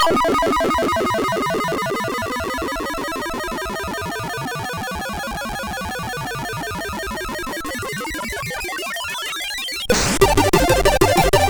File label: Random Waltz, MOS6581R3